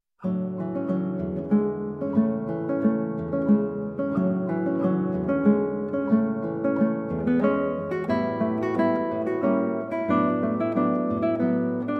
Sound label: Valse, Guitar and Ukulele; A La Maniere De Borodine; Maurice Ravel